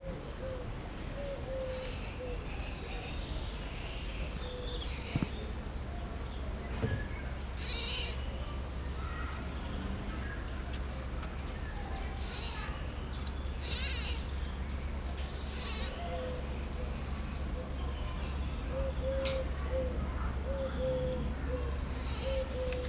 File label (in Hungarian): felhangositva, lisztmajmocska; szegedi zoo 2011